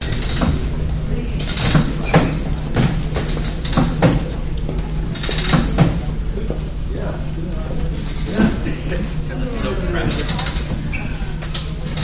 seabus station walla steps walking people ambience thumping; vancouver; lonsdale quay; your free sounds